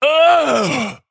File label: Female Dragon Wyrmkin Death